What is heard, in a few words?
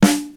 clean snare single hit d key